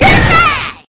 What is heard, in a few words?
hit bat; goodnight